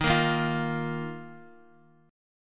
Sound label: D sharp Suspended 2nd, Guitar; strummed; JGuitar